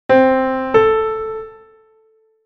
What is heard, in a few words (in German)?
Intervalle sukzessiv